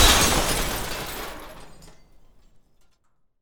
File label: windows break